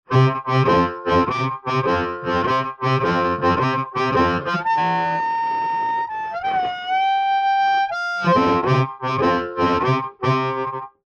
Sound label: Harp; TubeUlent